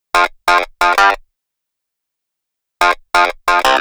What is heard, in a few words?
EQ Clav, keys